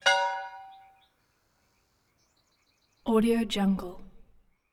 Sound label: church bells; prosoundfx